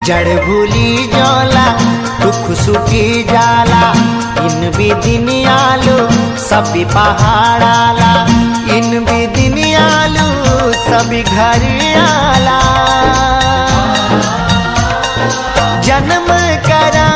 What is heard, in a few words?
Garhwali Song ringtone